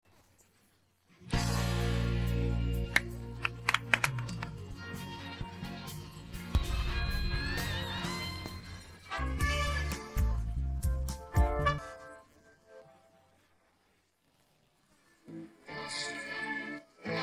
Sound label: no vocals